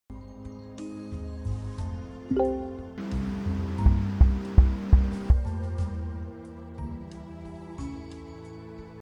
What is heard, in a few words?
audio slot